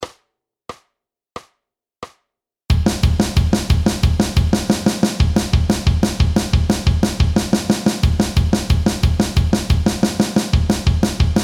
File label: metal Drum Warm Up; Intermediate Drum Lesson